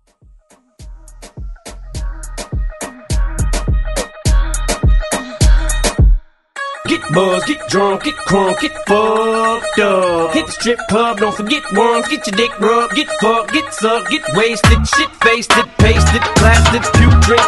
Moombah

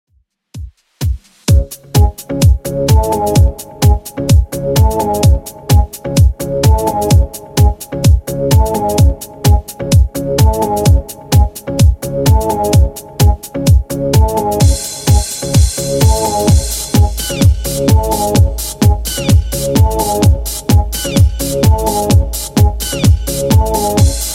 90s Redrum 128bpm

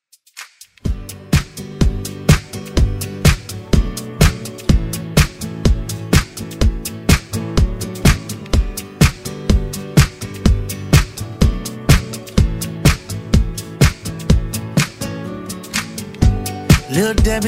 Country Redrum

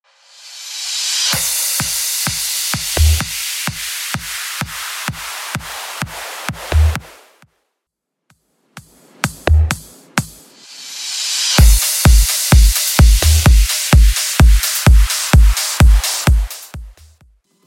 90s Bootleg Smash Up Festival Mix 128 Bpm

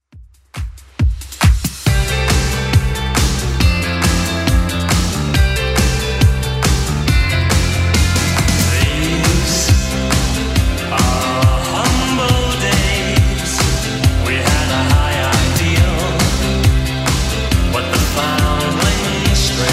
80s club 138 bpm clean; 80s Club Edit

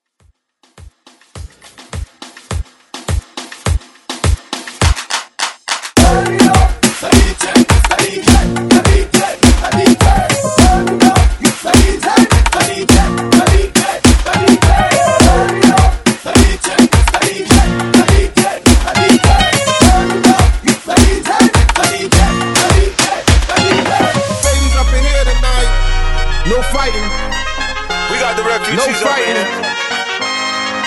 Moombah Mixshow Hype

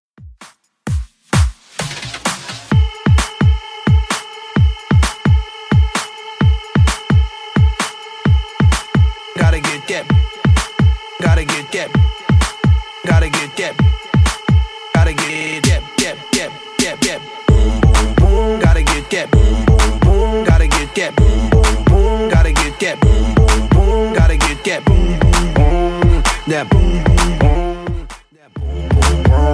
2ks Redrum 130bpm

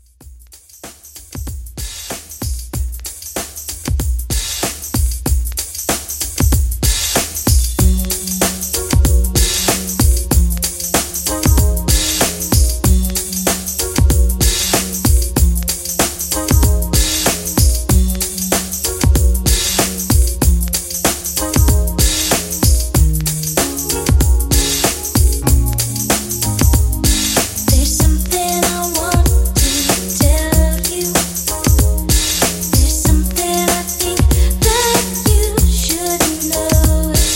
80s RnB Re Drum

